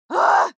female shout angry; schreie; menschen

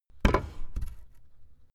fall wood; objekte; holz

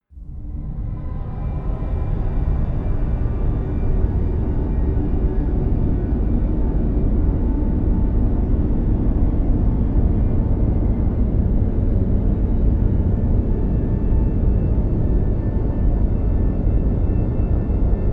drone shy but beautiful

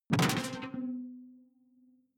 sci-fi alien bounce detuned bright; swooshes-swishes

